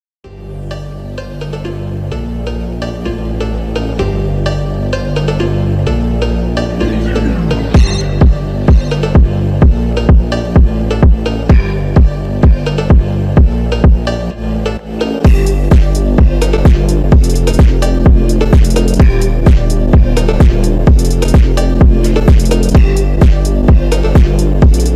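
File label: cool alarm clock; Крутой будильник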